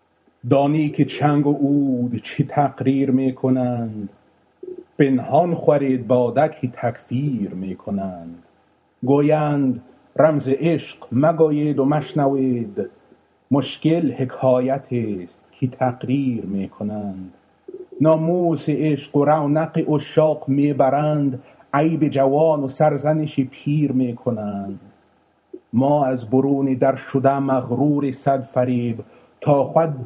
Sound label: ghazal; hafiz